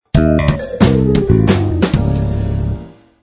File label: lose